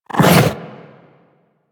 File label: Companion Melee Swipe; Warframe; Pets; Zanuka; Foley